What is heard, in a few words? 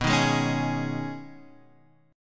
B 6th Add 9th B down Guitar Half Step Down